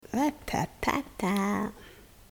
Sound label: wahtahtahtaah